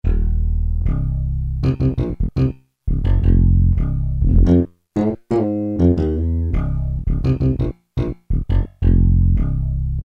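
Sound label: Slap bass, Yamaha TG33; Factory Presets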